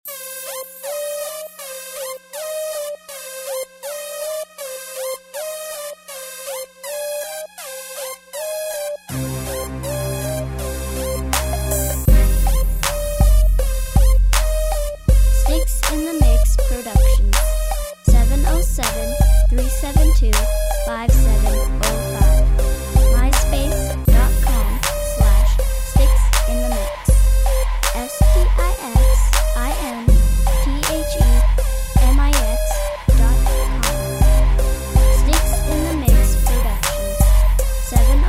crunk

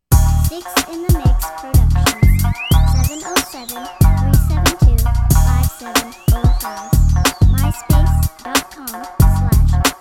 arab funk

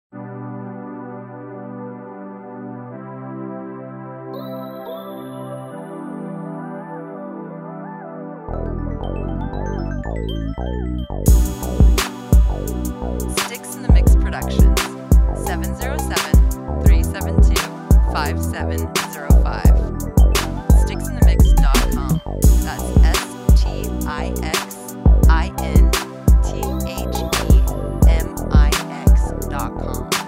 303 and a pad

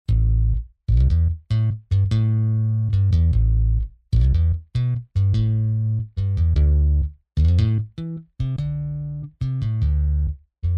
GHETTO BASS, beat sets

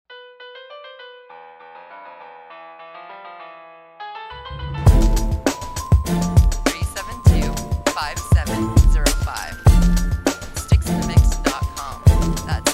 BB classical

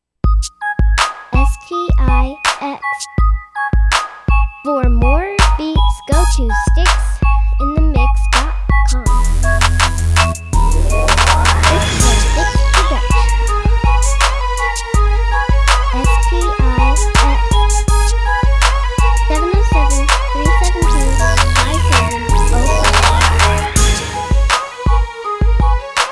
dirty melody

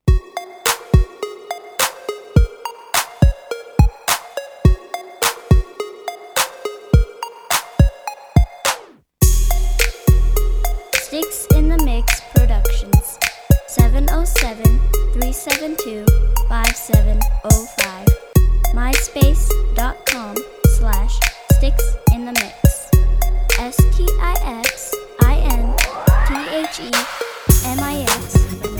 stacc square

